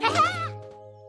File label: bubbles; oddbods